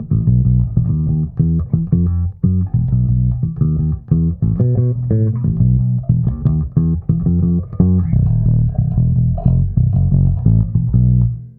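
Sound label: TRBX preset finger, bass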